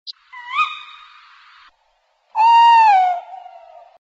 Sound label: bird tweet too; Animals